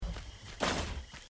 item pickup; risk of rain 2

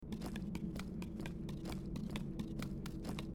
run; Zelda - Breath of the Wild; link

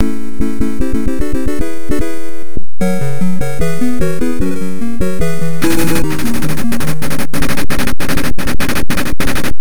level completed; commando

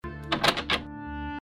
locked; The Cat Lady

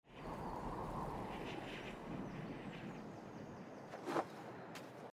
fall land; gris

